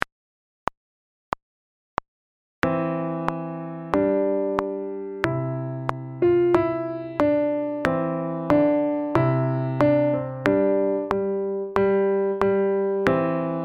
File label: Trumpet Voluntary